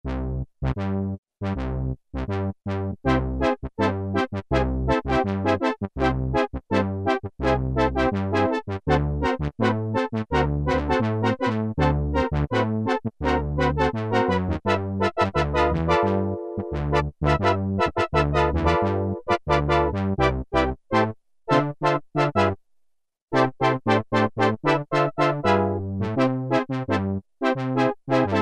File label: TROPICAL HORNS, Oberheim OB-8; Factory Patches